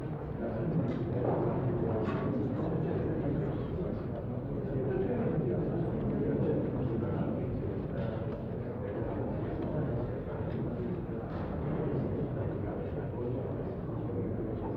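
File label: London court morning; the 39 steps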